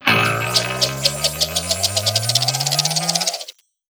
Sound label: near Win; netent